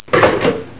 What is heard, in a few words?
klonck